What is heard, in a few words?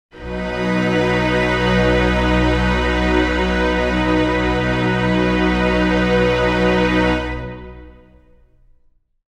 string chord b major